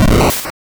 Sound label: hit, attack sound; カタナ１